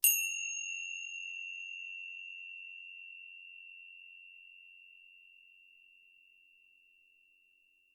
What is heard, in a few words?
tibcymb high sound